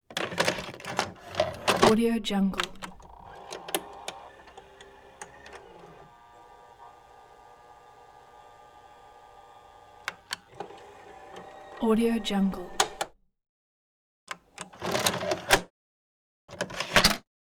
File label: video cassette recorder movements; hollywoodedge